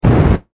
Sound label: Science Fiction Flame Booster Short Burst